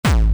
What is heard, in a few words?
Bombo Hardcore, kicks